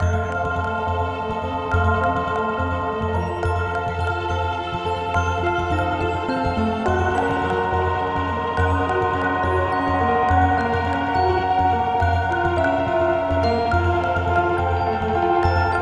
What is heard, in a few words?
Glitch sonata Slow; rive wreck hack die retry; ps4